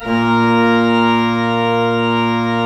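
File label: Pedal A#2 Right, church organ; Best Service; Advanced Media Trax Modern Composer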